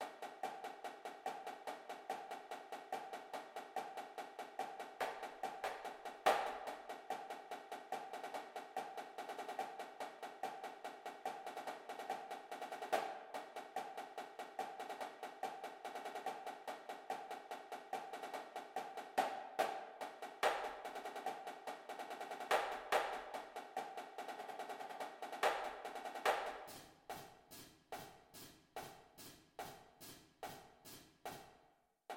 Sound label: snare